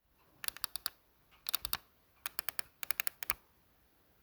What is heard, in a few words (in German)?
Daumentasten rechts